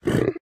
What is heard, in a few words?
say, pig; Minecraft; Votive World